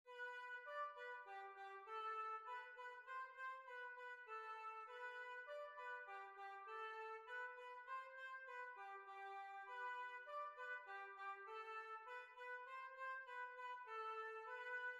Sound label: German Dance